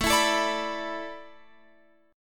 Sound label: A Suspended 2nd Suspended 4th, Guitar, Open F; Asus2sus4; strummed